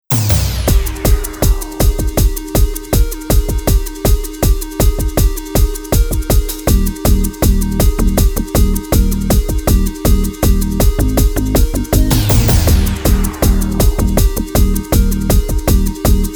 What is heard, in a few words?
Contemporary Medium